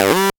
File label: Synths G; vengeance essential club sounds-3